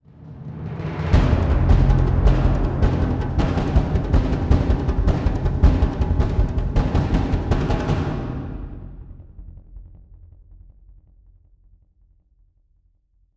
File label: String; assassin s creed unity